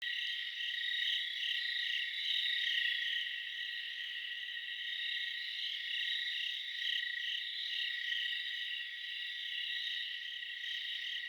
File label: Night Insects Loop